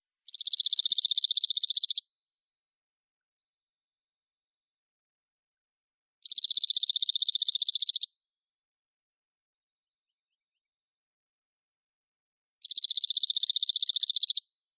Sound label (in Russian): ovsyanka ogorodnaya emberiza cirlus